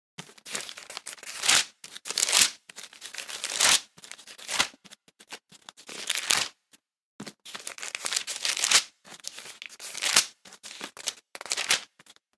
Turning Pages, Quickly turning the pages of a book; Rustling (Sound Effects:Objects)